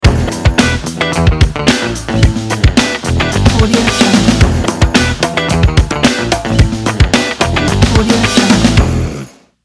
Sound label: groove intro ident